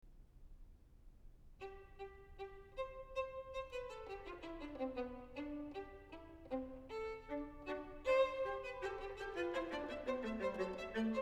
String Quartet No. 4 in C Minor, Andante scherzoso quasi All; Andante scherzoso quasi Allegretto; Ludwig van Beethoven; Beethoven - String Quartets No. 1-6, Op. 18